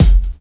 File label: rich high end bass drum single hit F sharp key